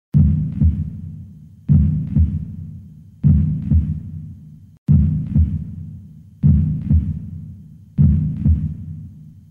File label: Heart Beat